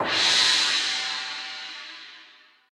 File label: Gong; boomerang fu